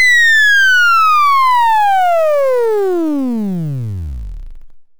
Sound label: fall